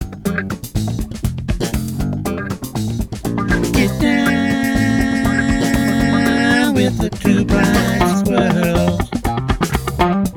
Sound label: funk promo; TBS